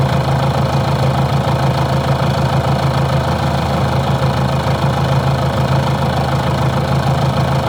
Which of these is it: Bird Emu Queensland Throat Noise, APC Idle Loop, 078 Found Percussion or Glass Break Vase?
APC Idle Loop